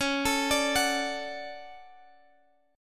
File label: D-Major, C sharp